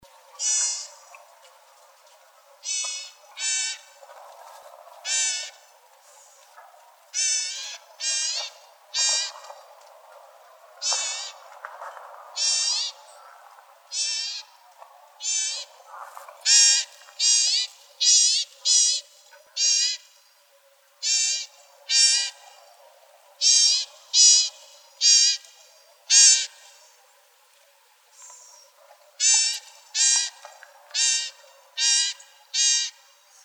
CALLS, Gnatcatchers; deviche

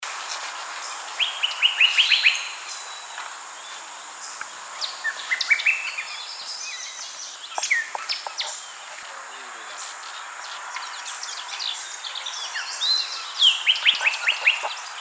WREN Sinaloa; Wrens Kinglets Gnatcatchers

